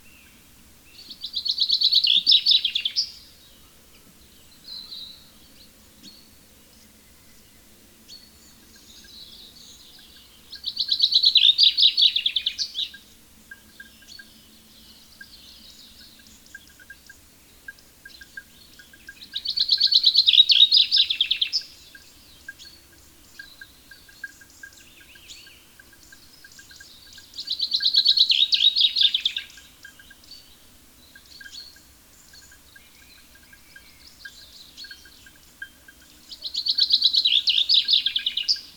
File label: Common Chaffinch Fringilla coelebs; chant des oiseaux des jardins